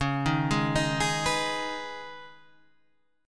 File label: C sharp 7th Suspended 2nd; down; chord shape